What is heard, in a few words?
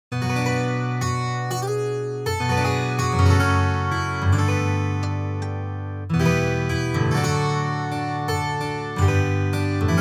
12 string